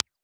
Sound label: RCA 44BX, IR